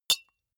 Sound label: deodorant hit, metal; soundpool